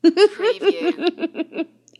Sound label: Female Giggle